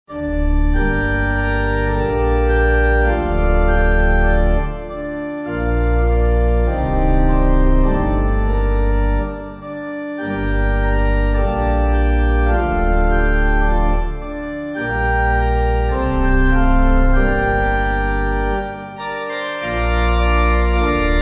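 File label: Pipe Organ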